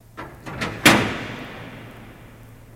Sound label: Door Latch Hvy Duty J